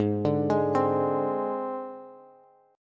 Ab Major Flat 5th Ab Bass; AbMb5 arpeggiated; JGuitar

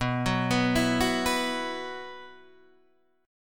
B Suspended 4th, Guitar, Half Step Down

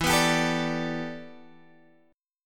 E Minor 11th guitar chord, Open G

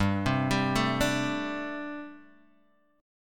G Suspended 2nd Suspended 4th, Guitar Open D

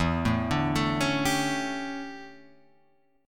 D Major 7th Suspended 2nd Suspended 4th

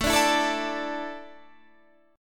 A Suspended 4th, Guitar Standard; Asus4 strummed; JGuitar

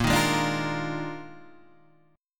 Eb Major 7th Suspended 2nd Suspended 4th Bb

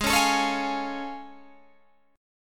A Augmented Major 7th Ab

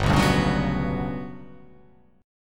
G Major Sharp 11th G down, chord; strummed; JGuitar

